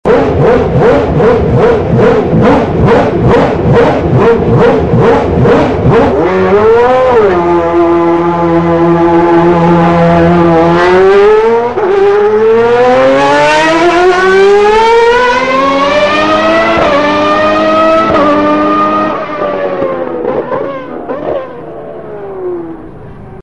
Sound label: amazing f1 ringtone